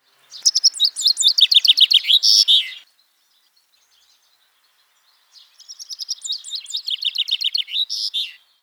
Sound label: Fringilla coelebs Chaffinch young